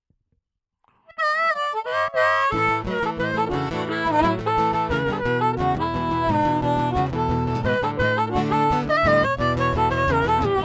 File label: lion acoustic